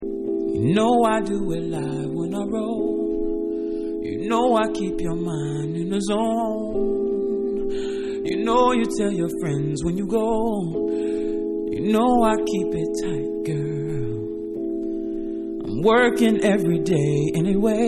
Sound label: Acapella